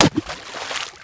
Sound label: gun water impact